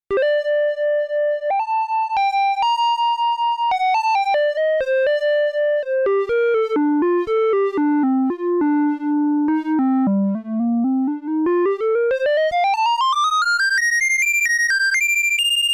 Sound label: Triangle Lead SEM